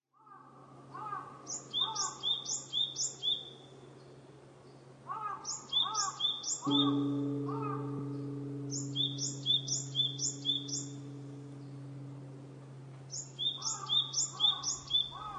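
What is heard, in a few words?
Japanese tit; sijyuukara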